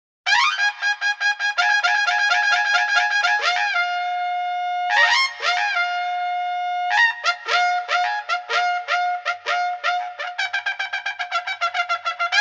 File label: tutari